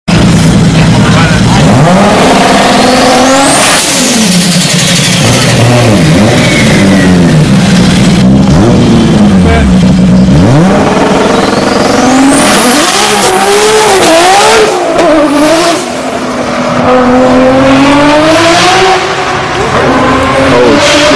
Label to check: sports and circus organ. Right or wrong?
Wrong